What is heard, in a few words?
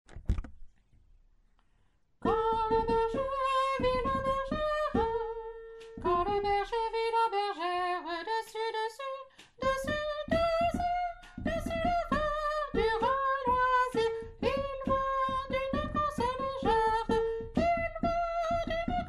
tenor